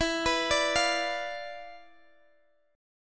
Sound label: D Minor 6th E, guitar chord; Dm6/E arpeggiated